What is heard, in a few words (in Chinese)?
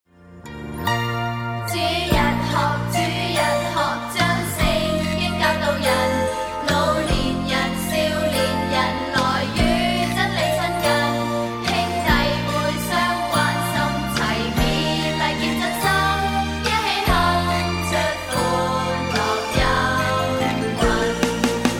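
Karaoke, 兒童詩歌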